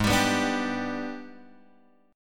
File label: G sharp Minor Major 7th Flat 5th, chord; G#mM7b5 Chord {4 X 5 4 3 3} strummed